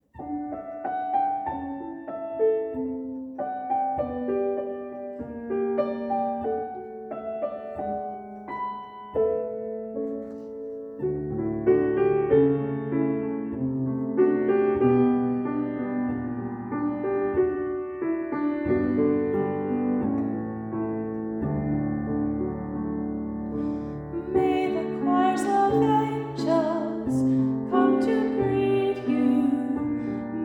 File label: refrain with and without piano